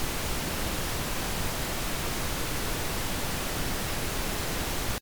Rosa Rauschen

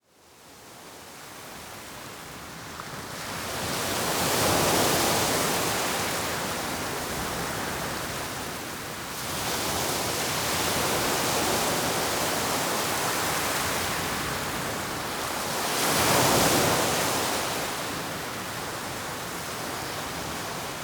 Meeresrauschen zum Schlafen

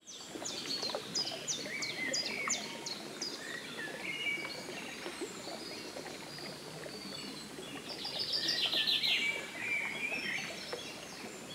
Vogelstimmen Vogelgezwitscher

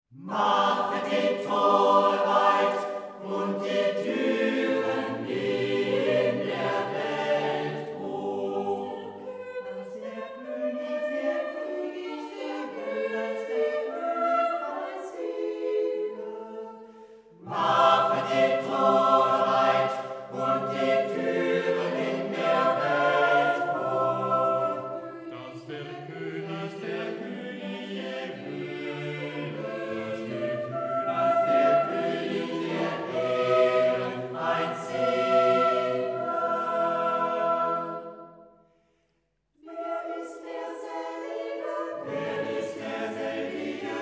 Chor

